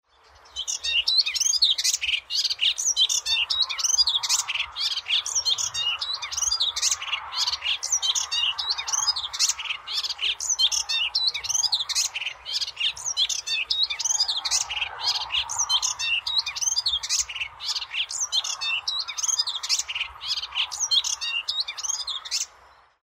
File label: melodious warbler